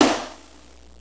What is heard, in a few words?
SNARE